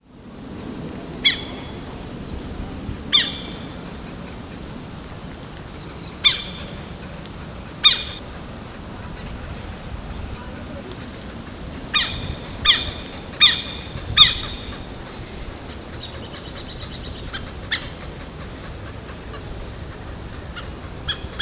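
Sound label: Vanellus chilensis, Jardim Botanico SP Brazil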